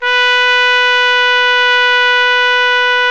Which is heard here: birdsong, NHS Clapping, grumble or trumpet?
trumpet